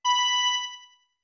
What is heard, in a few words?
Music Box B med; OOT